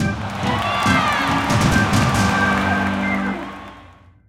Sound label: Transition; Cooking Dash